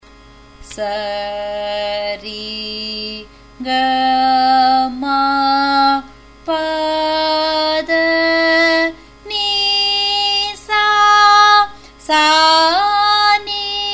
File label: Sarali Varise G sharp, music; Carnatic Music; Sarali Varisai in 5.5 kattai (G#, G-sharp)